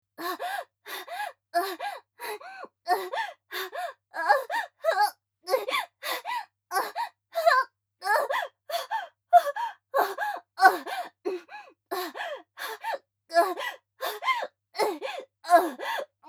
アナルファック中・息遣い・通常; A-13 ヘレナにアナルファック中・息遣い・通常; アスティリア

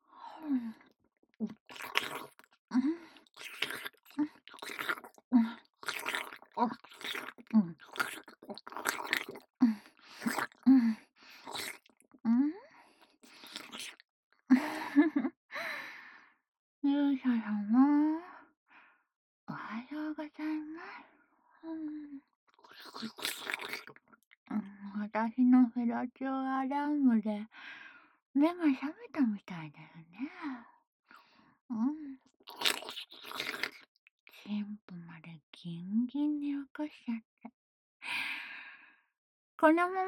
フェラチオアラーム

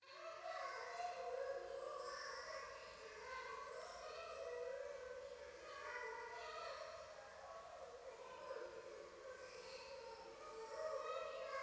可愛い 喘ぎ 雨; 夢の中

